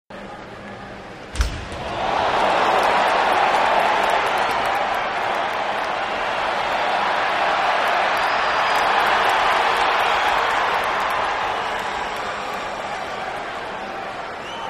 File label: Crowd, Hockey; Player Impact On Boards And Crowd Reacts With 'ohs' And Cheers, Builds.